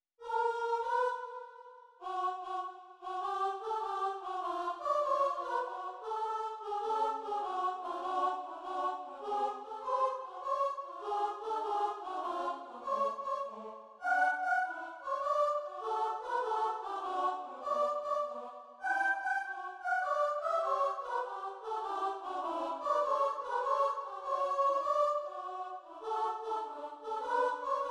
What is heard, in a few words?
Fugue sop; Fugue Trondheim no1-sop